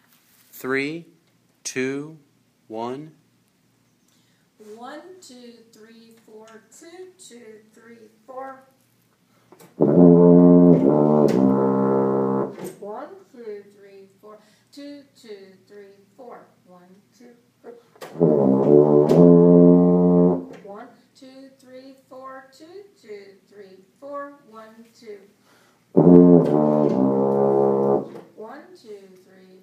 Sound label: Tuba